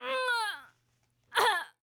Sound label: female death, human